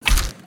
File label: walk; creatures organic